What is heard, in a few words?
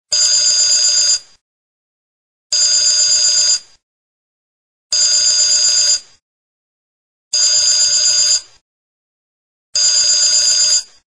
ringer; ringtones